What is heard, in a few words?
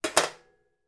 household knife or fork place down on metal draining board